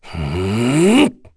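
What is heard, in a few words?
Vox Casting, Korean; Kain; kingsraid; voices heroes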